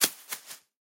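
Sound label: baseball sounds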